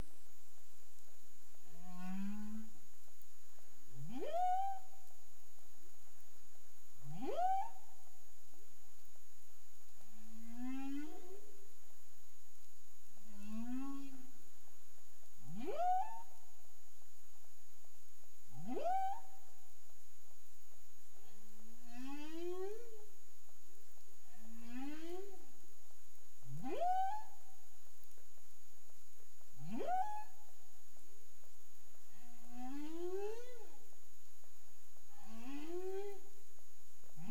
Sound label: clic; BERMUDE